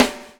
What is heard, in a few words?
BUBL, BUBBLE; Spectrasonics - Backbeat